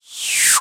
Synthetic Blow

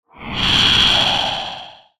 Sci-fi Transition

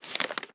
Dry Short Paper Crumple

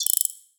Digital Rattle

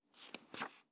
Rustling Paper Page Turn

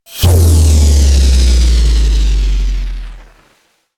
Noisy Bass Drop

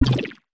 Sci-fi Pulse Gun Blast

